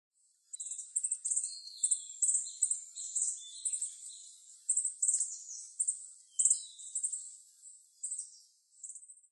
yabusame, sapporo

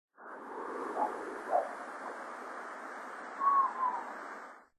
okonoha

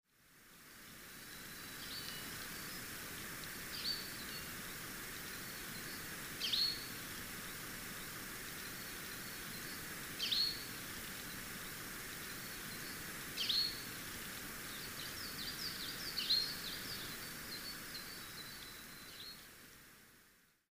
mahiwa, chichibu, koe

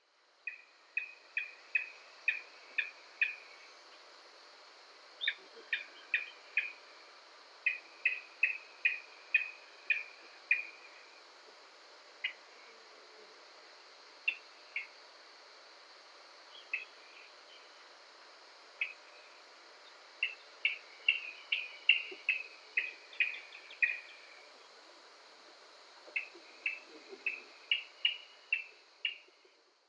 yanbaruk; kunigami

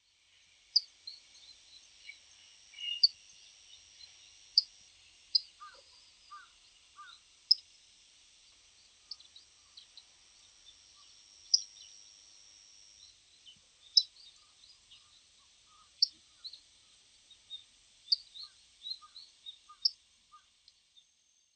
shiragahojiro; nagasaki

